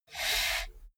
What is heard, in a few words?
computer mouse draw